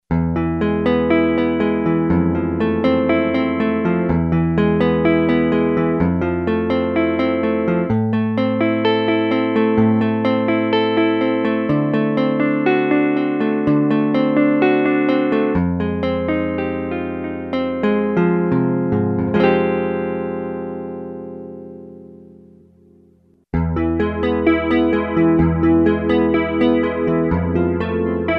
stereo phasing, Yamaha REX50

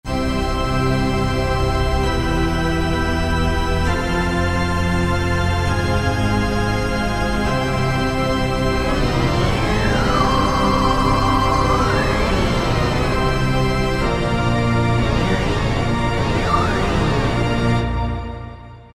Mod Amb. Pipe, Roland SR-JV80-06 Dance

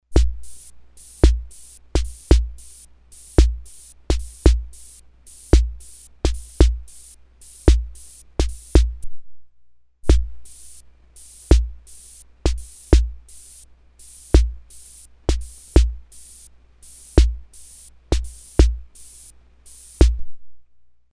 DIXIE LAND, Ace Tone Rhythm Ace FR-2L; DIXIE LANDD

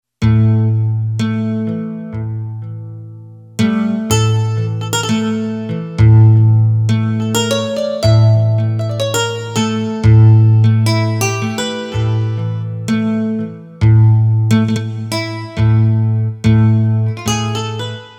Oud, Yamaha PSR-550, XG sounds

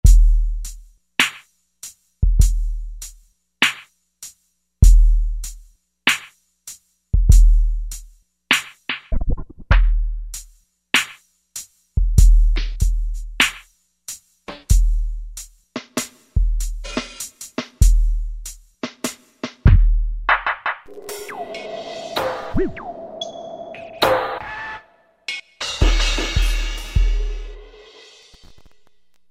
Trip Kit; Roland SRX-05 Supreme Dance

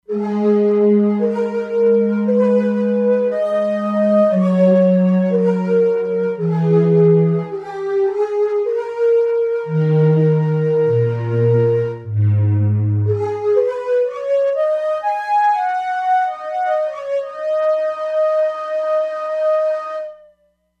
Good Breath, E-mu Proteus-3; Factory patches

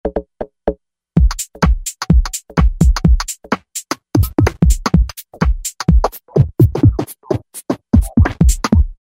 House & Ele; Factory Patches

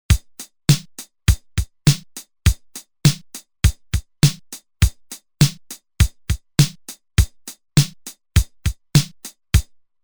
8 Beat Rock, Roland TR-505; Factory Rhythms

